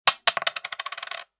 コインが落ちる音; 場面切り替えや心理描写; 演出効果音

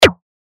ブロックを戻す

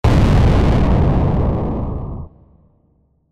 爆発

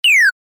撃つ レトロ; モノラル

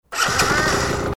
原付のエンジンをかける音; 環境音

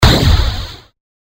ロボット ジェット噴射 モノラル

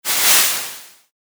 薬草 モノラル

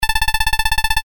会話

